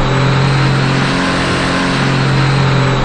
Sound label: Ferrari in; AOS F1 2022